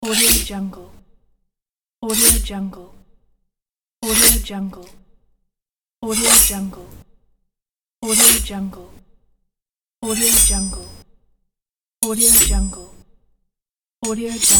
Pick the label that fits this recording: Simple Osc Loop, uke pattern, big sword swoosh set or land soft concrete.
big sword swoosh set